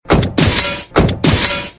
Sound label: Surround Glass Broke